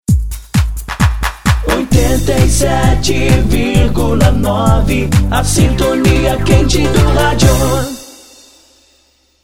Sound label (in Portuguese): vinheta cantada